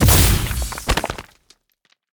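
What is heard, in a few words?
Trap Electric Turret death; monster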